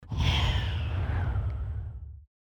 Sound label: effect sneak; baldursgate